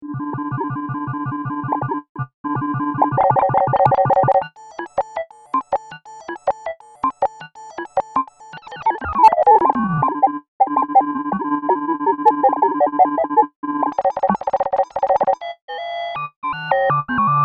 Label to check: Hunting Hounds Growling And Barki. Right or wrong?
Wrong